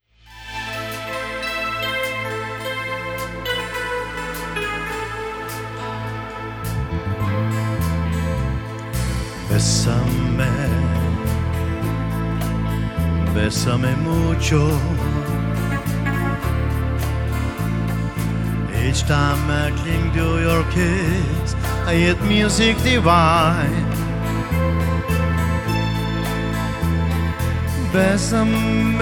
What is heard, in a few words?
Rumba